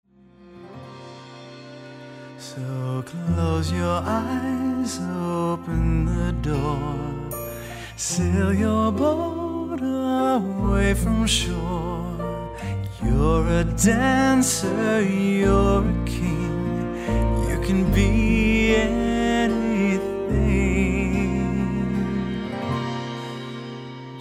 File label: pillow soft mix